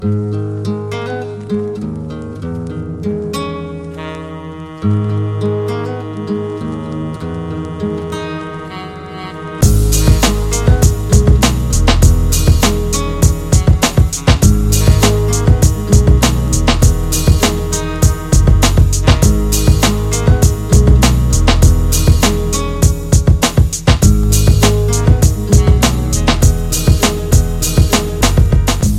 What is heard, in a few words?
Old School Hip Hop